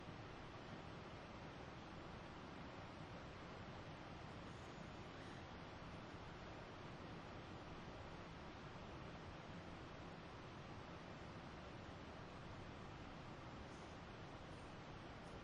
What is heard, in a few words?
Roaming EVP